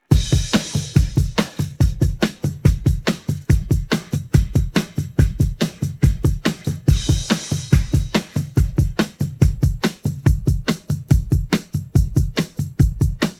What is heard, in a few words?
pattern, drums